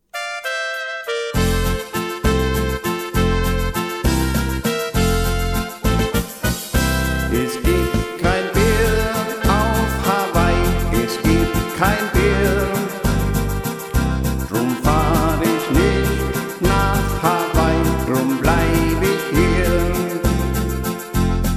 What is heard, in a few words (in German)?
Walzer Stimmungsmedley